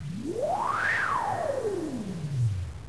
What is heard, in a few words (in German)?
Wand